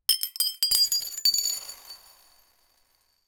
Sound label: loud sound of falling, diner